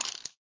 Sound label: Cat launch aim